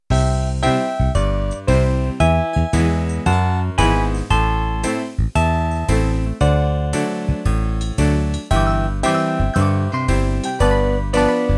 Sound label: Country Ballad End